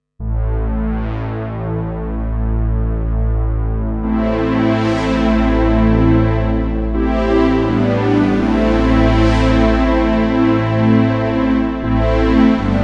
Big Panner

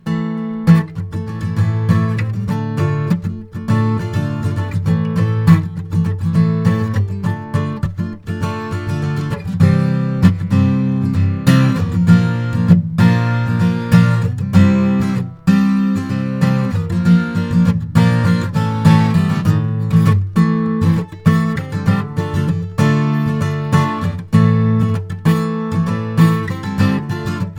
strumming

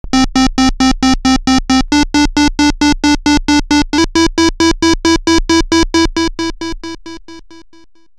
Roland SH-101

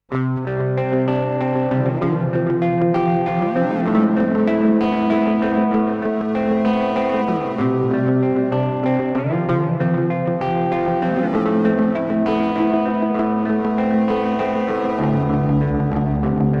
Headrush Looperboard Loop regular

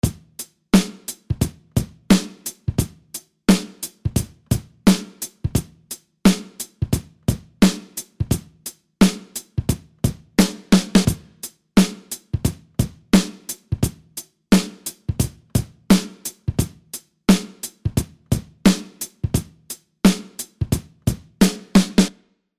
Drums Twin Tube

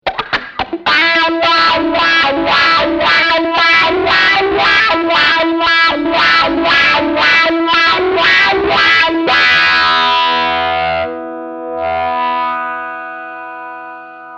Single Notes

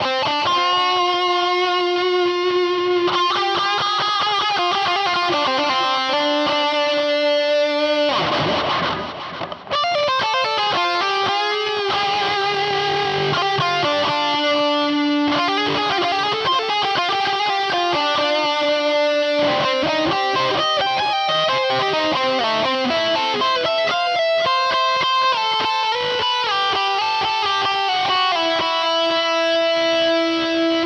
Harley Benton DNAfx GiT DS Solo

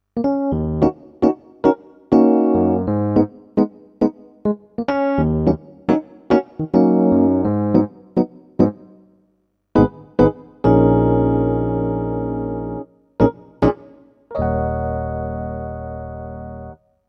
Casio CDP-S160 E-Piano

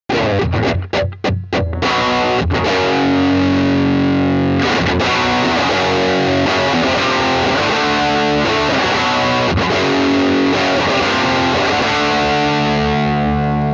JCM Rock ES335

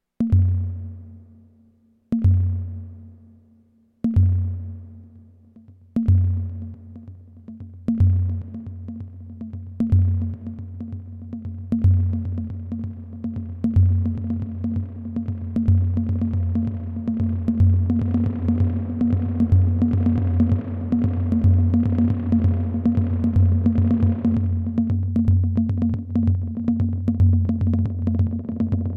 drum

